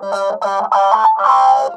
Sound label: SINUS, Funk Guitar; 90 s Sample CDs; Zero G